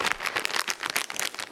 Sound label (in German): Rascheln einer Tüte